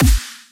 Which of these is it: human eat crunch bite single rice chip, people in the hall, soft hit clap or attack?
soft hit clap